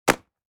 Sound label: Leather wallet falling and impact; foley